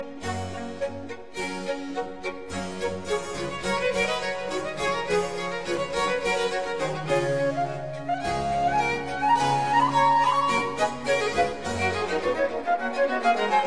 Quartet Allegro; Quatrieme livre de 6 quatuors; No 5 in G major; TWV 43G5

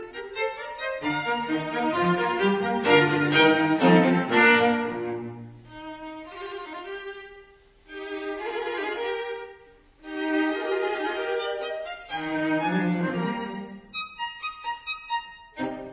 String Quartet No 5 in E flat major Presto; Haydn

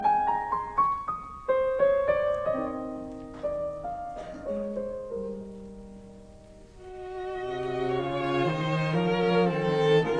Piano Quartet in E flat major Larghetto; Mozart; K 493

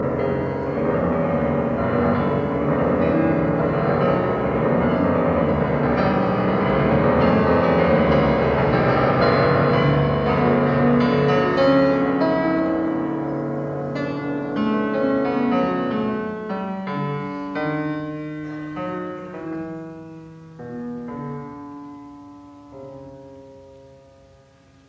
Ballade in B minor; Ballade No 2; Claudio Arrau

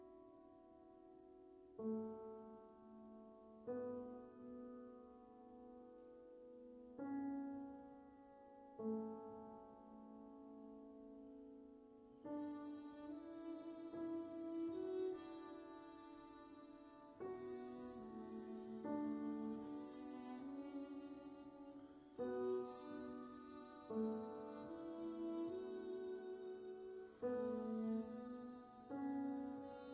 Passacaglia; Mirecourt Trio

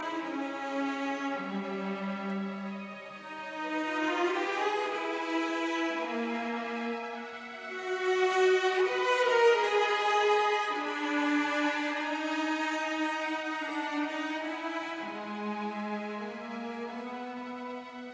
Symphony in E major, Allegro molto; Symphony No 7; WAB 107; modified 1885 version ed A Gutmann; vivace

